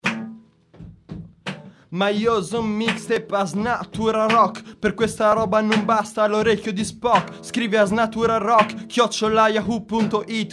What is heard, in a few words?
Rap